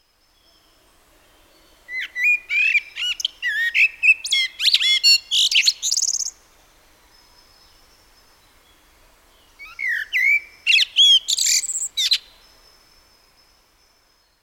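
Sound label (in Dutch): Merel Turdus merula, fluiten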